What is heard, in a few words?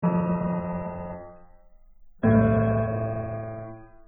piano